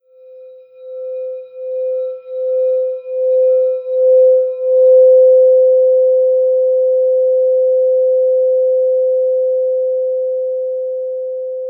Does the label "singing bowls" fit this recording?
Yes